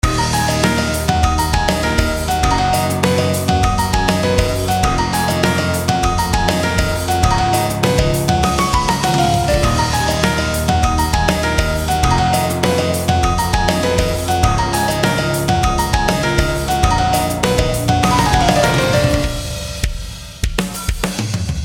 MELODY

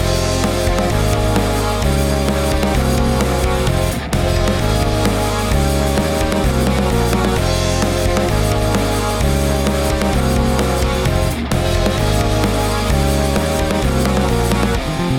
intro chorus

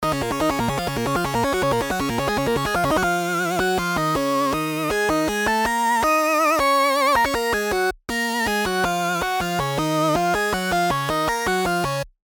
3xosc

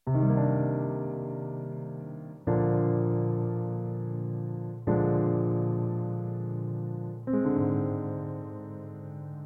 piano

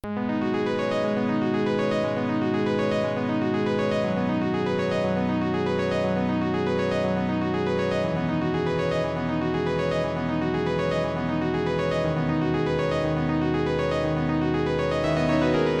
dexed synth arp stay loop

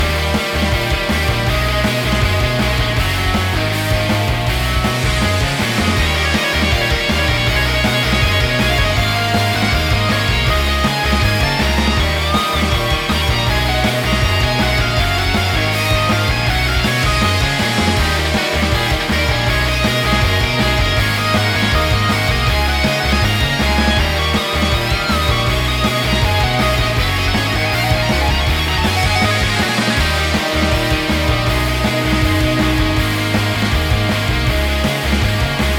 solo

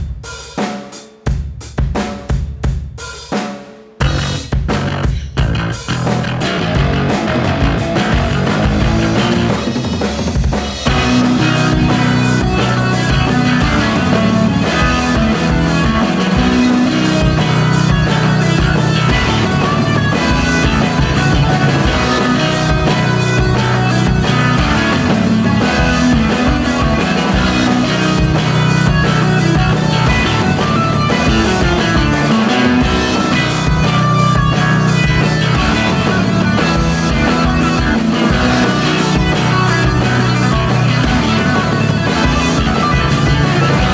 chorus solo

